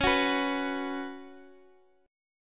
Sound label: C sharp 7th Suspended 4th C sharp, Guitar Open D